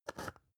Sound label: spice jar scratch close short